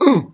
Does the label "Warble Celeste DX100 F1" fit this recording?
No